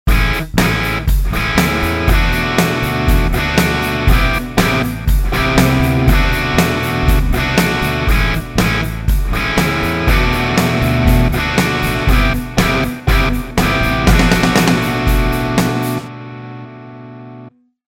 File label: Test 3 Chords Dist